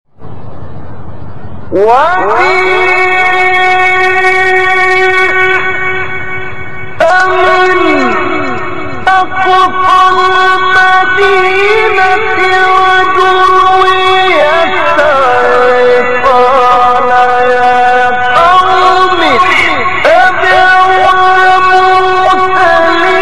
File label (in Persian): تلاوت زیبا از سوره یس